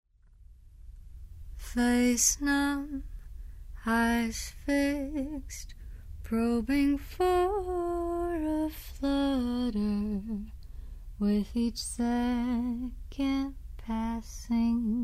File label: Heartbeat Slow